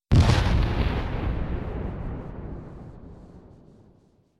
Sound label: Explosions; evil-inside